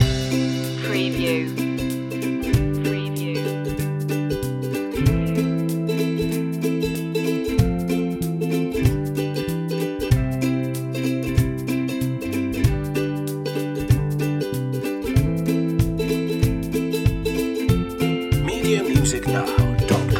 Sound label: my happy ukulele loop